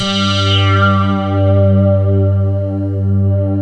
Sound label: AVALON C2; Rubber Chicken Software Sample Bank